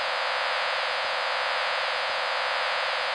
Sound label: red blue straw; TexsNSounds